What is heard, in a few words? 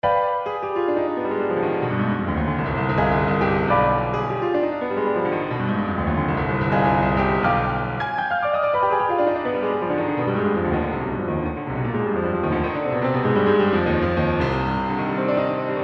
Grand Pianos plate long; kakumei Equinox